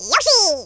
yahoo; yoshi; SM64 CO-OP

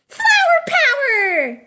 twirl bounce; daisy; SM64 CO-OP

